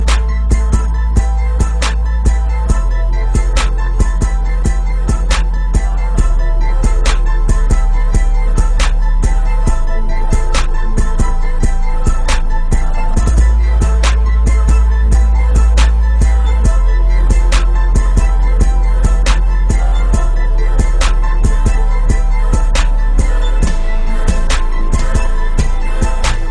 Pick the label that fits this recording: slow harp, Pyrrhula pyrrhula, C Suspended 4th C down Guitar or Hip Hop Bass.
Hip Hop Bass